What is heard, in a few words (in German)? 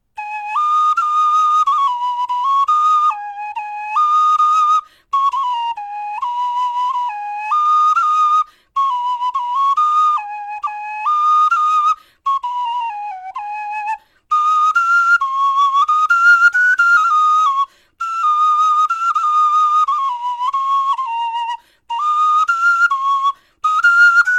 melodie